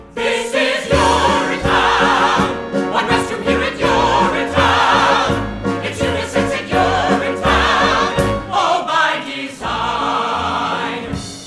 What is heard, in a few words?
ensemble; urinetown